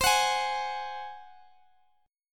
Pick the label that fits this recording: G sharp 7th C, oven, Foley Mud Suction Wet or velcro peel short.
G sharp 7th C